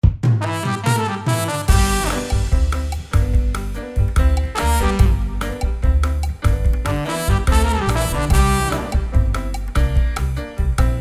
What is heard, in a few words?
Retro TV Theme Game Show